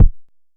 analog quick, Kickbank; mpk mini presets; Ableton Foundation FreePacks

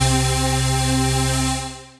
shimmer pad; Synth Pad; Ableton; Factory Packs; Build and Drop

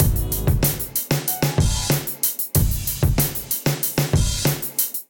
Canvas Kit, Drums; Ableton; Factory Packs; Chop and Swing

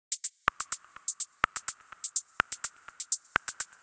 D55 Break, 125, Drum Machines; Klondike; Ableton

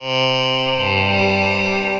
Galaxy Voices, Choral Voices; Ableton; Factory Packs; Voice Box; Chromatic Voices

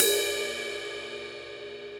CRASH edge tip, cymbal; Ableton; Factory Packs; Drum Booth

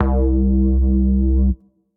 Hard Garage, Bass; Ableton; Skitter and Step

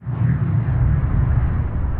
Ion Storm, dark textures; Multisampled

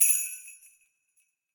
Jingle Bell A, Latin Percussion; Drums; Drum Hits; Ableton; Factory Packs

